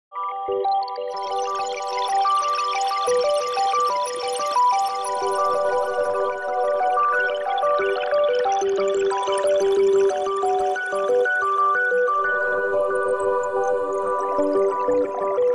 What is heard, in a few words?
Jingle